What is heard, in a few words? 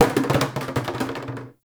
Plastic Hit